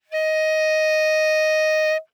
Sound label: Bass Clarinet ff Eb5 stereo; Woodwinds